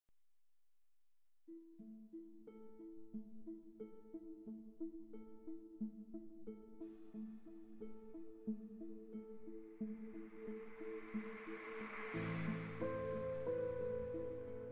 Suite (Piano)

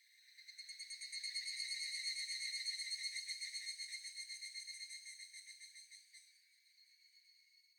Ambience Cicada; Devour; The Inn

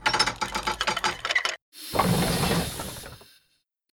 open lotus door; through abandoned the underground city 2015 gamerip pc macos

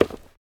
Footsteps, Human, Tile, Run; Backrooms 2D

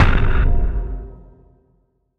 ax move loop; yo kai watch 4 bokura wa onajikara o miage tale 2019 switch gamerip

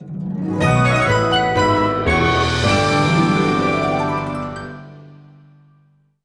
Key Item Obtained; paper mario the origami king

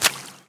walk water

